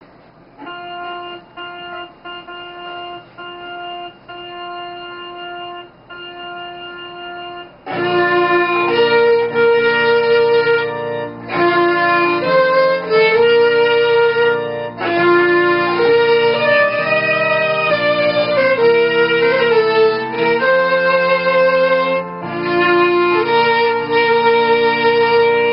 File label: Bridal March, violin